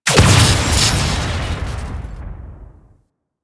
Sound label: Corpus Powerplant Battery Explosion; Ambience; Warframe; Corpus Railjack; Tether Power Plant